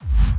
BD Gate FX, Bassdrums; Vengeance Samples

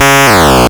Arcade Riffs

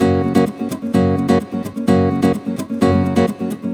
Nylon Guitar Kit, F# maj, 128BPM; Vengeance Samples; Special Sounds

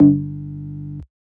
Synth Cuts; Vengeance Samples; VES1 Instruments

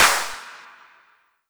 Clap; Vengeance Samples; VEC1 Claps

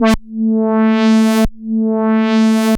Roland A A3, synth samples; Roland S100; musicradar-synth-samples

